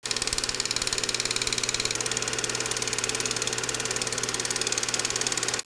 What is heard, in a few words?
Laser Lab Sound